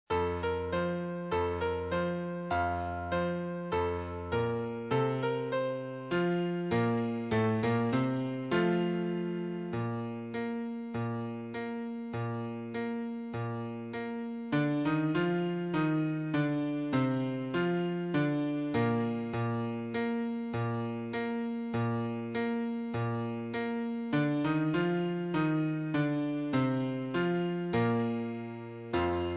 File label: Clarinete Musette Acc